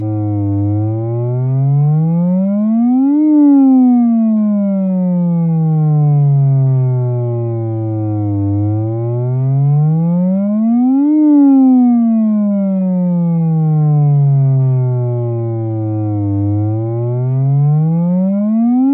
strobe

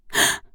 gasp female

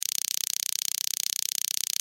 taser hit; Weapons; Guns

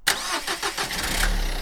vehicle startup

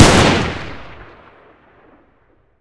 lmg, Gunshots; Weapons

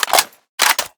M1918 Reload; Weapons; Guns

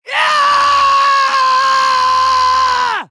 female generic warcry

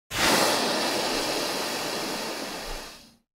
cautery, Surgery; civ13; civ14; Shitmed Medical

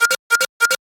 lmg empty alarm; Weapons; Guns

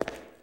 tile, Footsteps

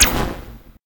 blink, Magic

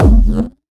supermatter start; Grenades

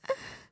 woman gasp

